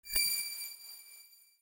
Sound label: notification